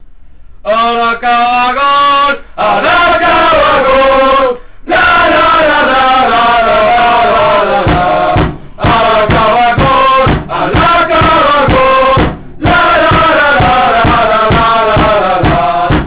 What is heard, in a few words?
song